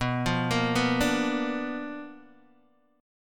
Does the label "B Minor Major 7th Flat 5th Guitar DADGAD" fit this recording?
Yes